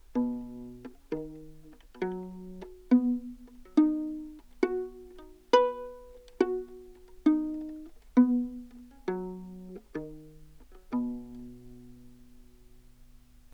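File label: baroque viola arpeggio pizz, Anechoic; OPENAIR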